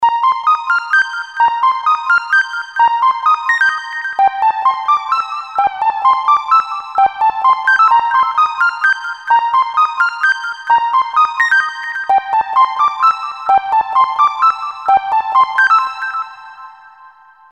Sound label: synth, Roland JV2080; Synth: maybe too simple; main core cpu manages up to 64 voices polyphony; DAC is a 18 bits